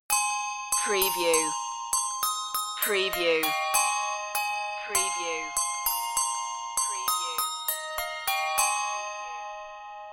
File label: handbells